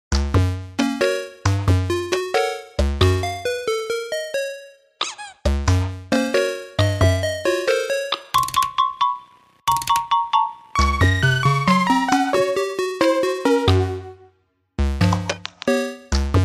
8bit (Eight bit); Ikinari Anata ni Koishiteiru Soundtrack CD 2011

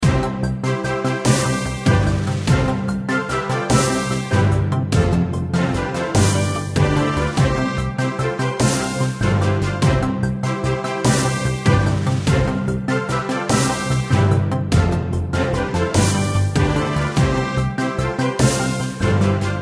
3 Star Win; rush wars

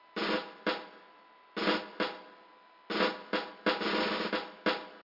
snare beat; ds; de Blob

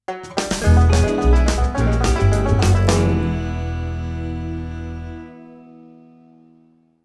(Jingle) Turret Minigame Lost

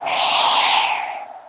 Cold

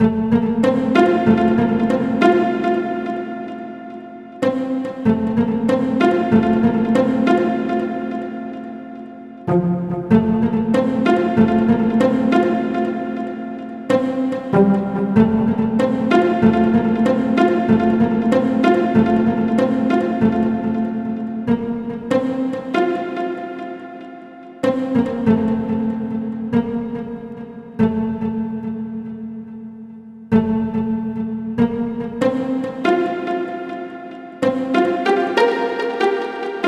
Bass

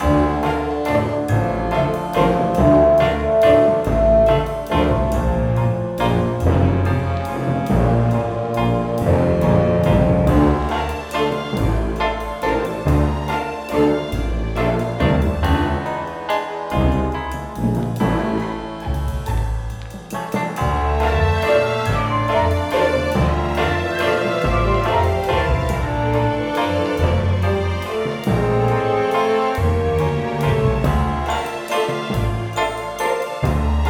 Creepy Forest; don't starve

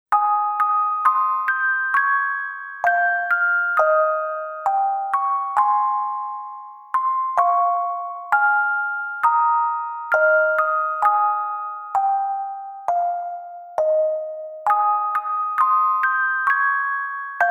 orgel; chiisana tegami; Midori no Hibi

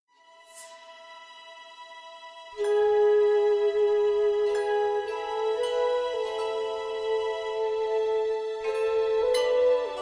Music Stem

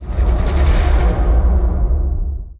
Swinging Ship

